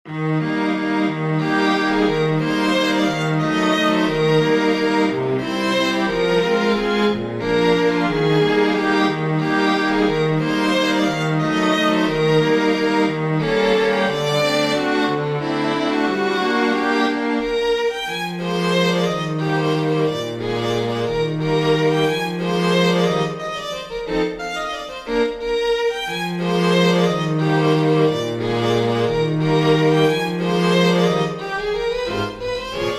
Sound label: waltz for string quartet; tchaikovsky piotr ilitch